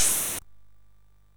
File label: Shuffle, sid MOS6581R3